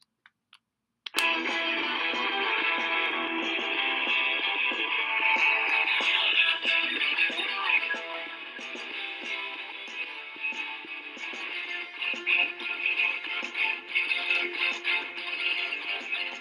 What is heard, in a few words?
2nd button song